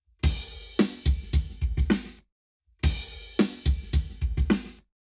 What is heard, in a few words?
Lab Wet Drums 48 0 to 360 deg; eMagLS